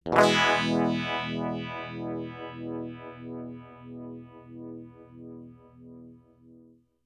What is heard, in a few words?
strum full depth regen at noon rate at noon 2 stages